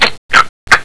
cluck; lmctf

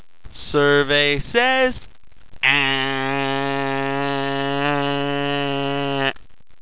bump; openffa; luptonium logo

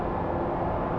dark; spawngirlsounds

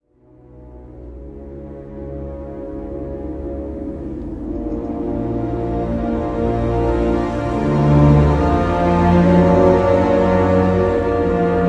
dose music; spawngirlsounds

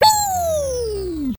hoeiiiii

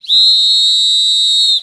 whistle; openffa

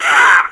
pain; openffa; mercy

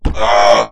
fall; opentdm; baron

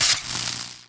item break

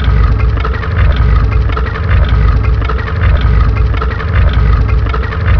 door; corncob

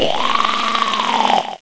pain; critterz

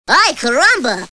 pain; openffa; bart

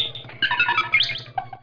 gurp; openffa; r2d2

